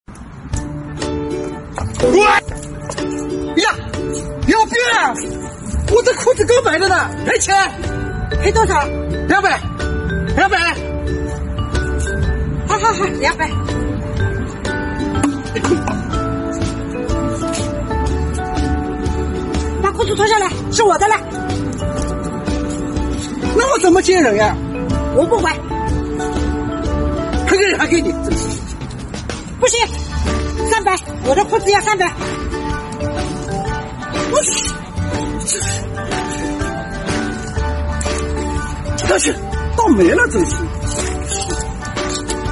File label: laugh; breadradio